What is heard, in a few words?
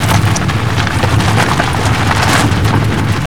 surface tire rocky slow loop, vehicles; Call of Duty Modern Warfare